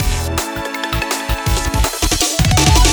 Transition; sky rogue

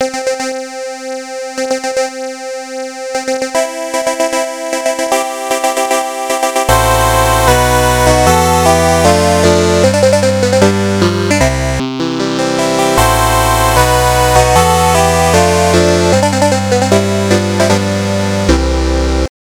completed music; BRIDAL

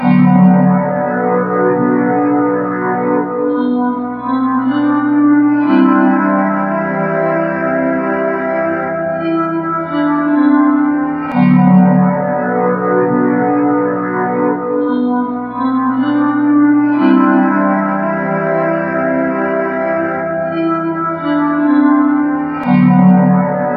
Game Over Reversed; Game Over (Promotion) (Old) (Reversed); Friday Night Funkin' Mario's Madness; PC mod gamerip

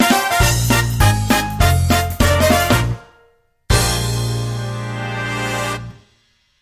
Boss Clear (Fanfare Only); Yoshi's Woolly World

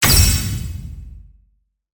Land Link Middle

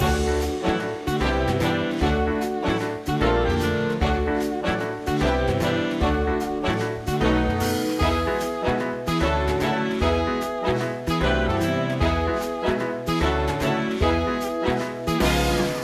Menu Layer

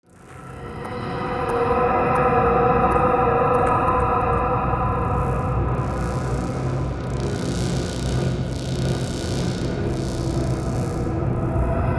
Amb Boss

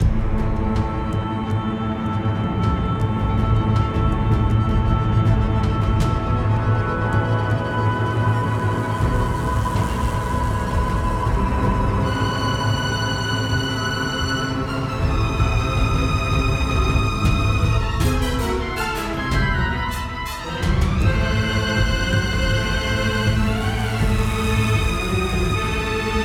Boss BGM Wrath; sinner sacrifice for redemption

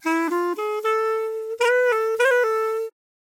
Pungi Sucsess; tintin reporter cigars of the pharaoh

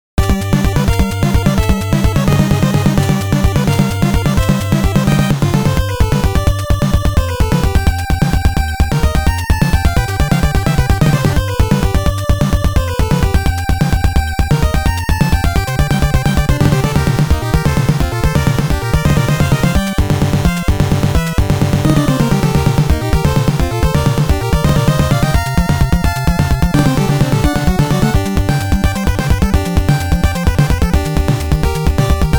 Stage 3 BGM; Gradius

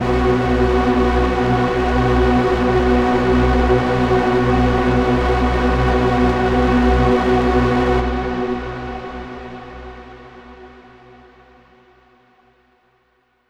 Overlay Drone; Act2 Anchor

